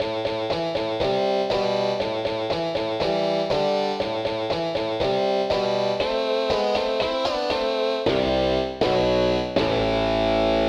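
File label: distortion guitar; Roland - An Invitation To The Roland World Of Music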